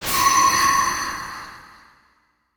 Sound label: SE SOUL VACUUME